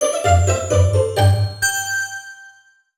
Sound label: Unknown Jingle; Wii Sports Club